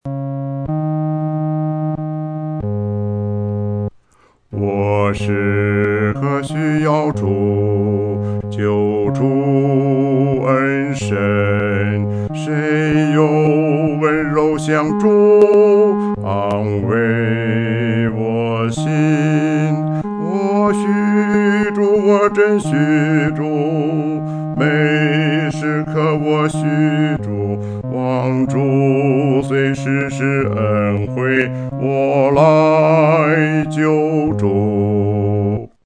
独唱 第四声

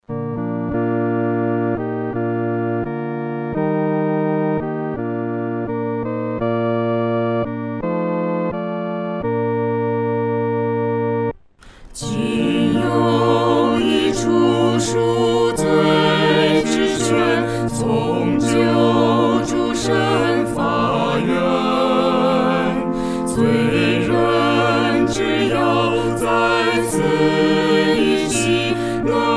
合唱（四声部）

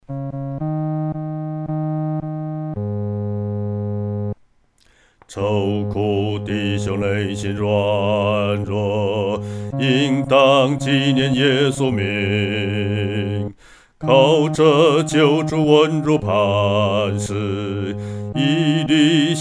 独唱 第四声; 尊贵名

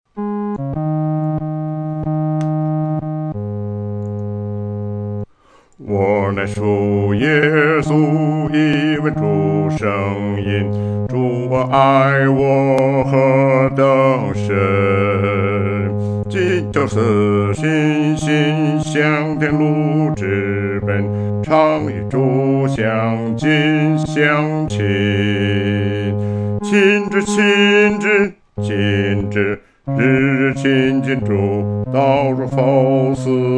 独唱 第四声

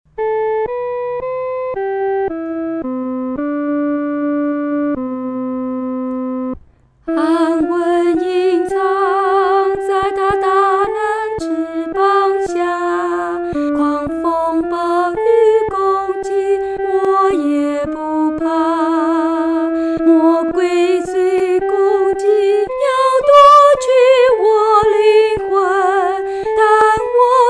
独唱（第一声）; 主翅膀下

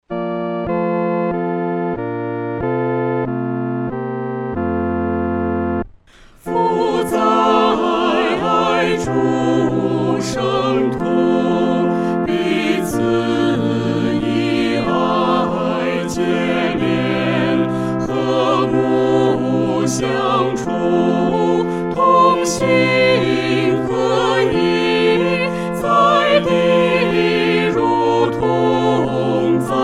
合唱 四声部

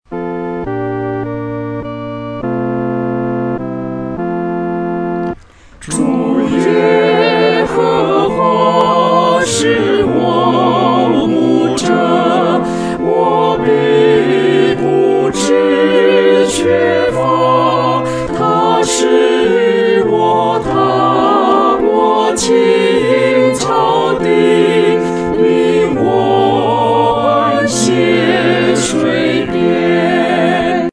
合唱 四声部; 主是牧者

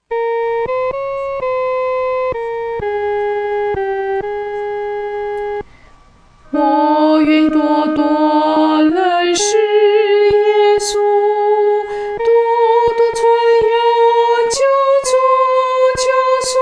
独唱 第一声

